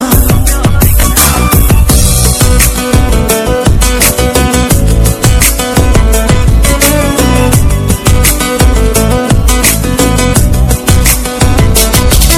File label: musical background ringtone, bollywood ringtones